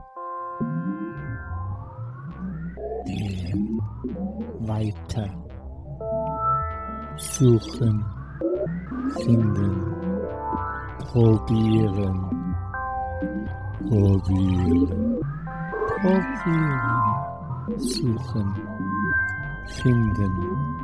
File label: Voice Wind Bass Strings